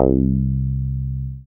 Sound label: bass Squaaare; Best Service Gigapack 2